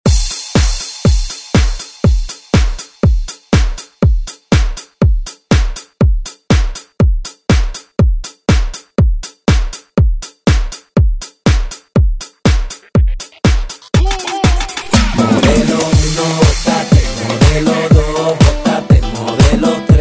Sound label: ReDrum; DJ Mhark